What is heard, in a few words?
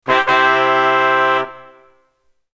Tada sound